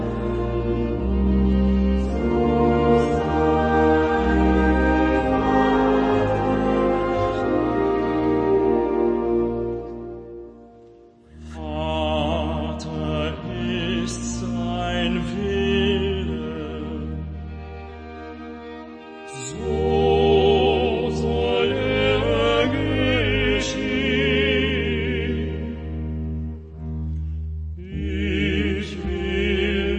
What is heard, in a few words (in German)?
Passionsgesang